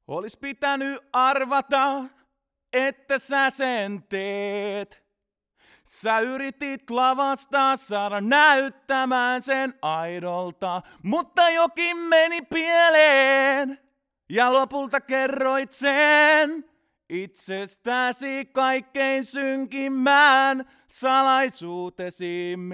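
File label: male vocal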